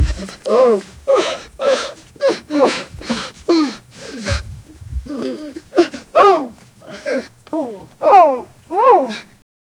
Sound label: a woman wearing a leather, strikes